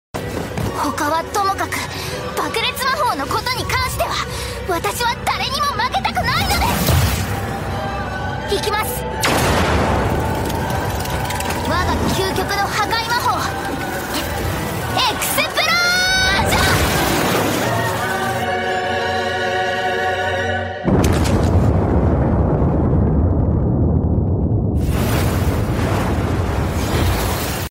explosion; konosuba megumin

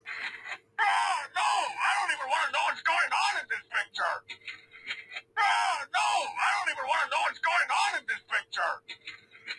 augh

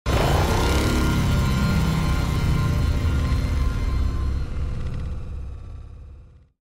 earthmover alert siren; 1000 thr earthmover

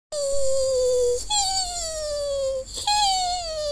hehehheeheeee

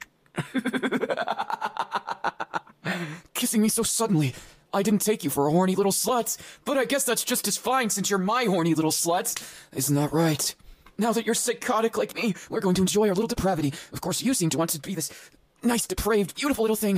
mwahahahahaha

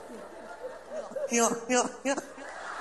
laugh; hue hue hue

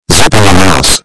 zipper

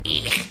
eyech; jontron

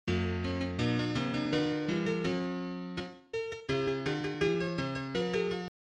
plays the piano

